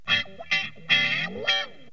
FUNK; L'Effet Pommier - Volume 04; Rythmes et Intros 4

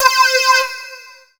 lead, synths

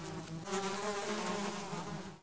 flies; The Sum of All Fears

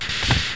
Shutter; Shutter Bug; HardCopy

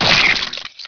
death, insect; monsters insect; Heretic II

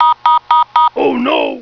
Warning; PPPop 1.6

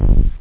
terror, drums bass; OctaMED SoundStudio V1

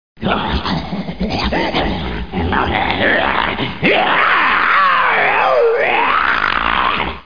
monster; DR BRAIN; BUGS

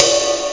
ride cymbal

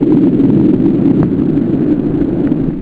Final Boom; Grunt

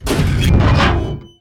Crushing Metal; 1944 Battle Of The Bulge

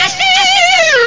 aciiid

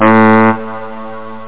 saxophone; Amiga 8-bit Sampled Voice; 8,363 sample rate

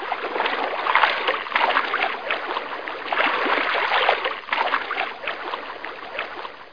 Pond Noise; bugbook

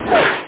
Missile

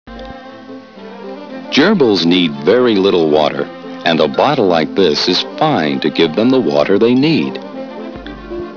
gerbile; Hobby Corner: Nature Hobbies

